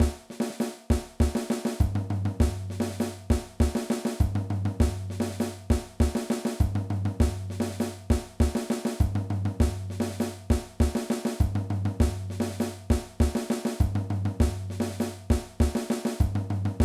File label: Afro Cuban